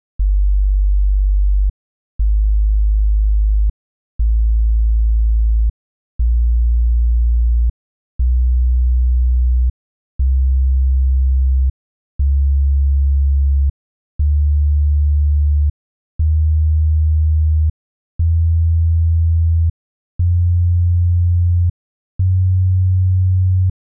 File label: 2 octave walkup; hometracked; simple ways to test your rooms frequency response